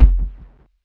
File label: KICK SOFT; DRUM N BASS:JUNGLE BEATS; DRUM N BASS KIT 2